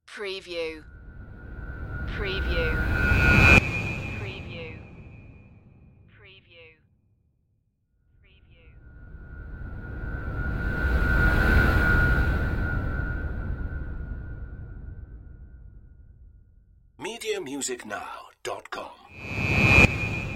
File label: scary whooshes